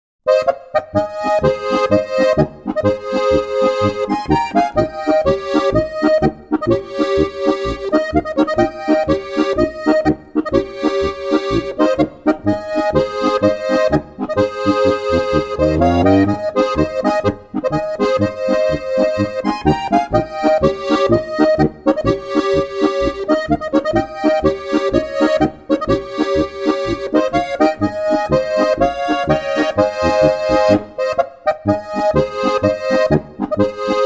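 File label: tiroler buam polka, Steirische Harmonika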